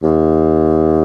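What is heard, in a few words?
SOFT BASSOON D#2; Northstar - New Gold VOL-2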